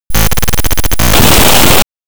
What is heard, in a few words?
glitch